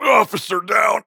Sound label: Death; Knight; Paladins